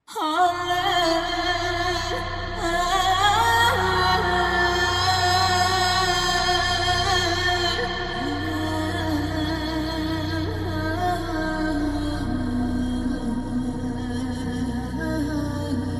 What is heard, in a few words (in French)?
voix hicaz Bb